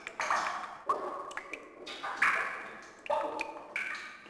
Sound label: mine drip LOOP; Cars; XBOX